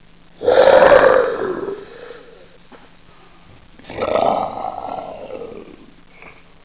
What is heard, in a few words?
demon